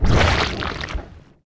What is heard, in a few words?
Artillery Corpse Explode Death; war3source